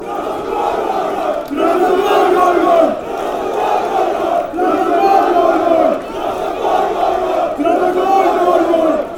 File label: trabzon goal goal goal, fanchants